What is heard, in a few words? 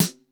TEK.S, TECHNO